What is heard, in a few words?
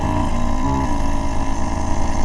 pain; portal house; vortigaunt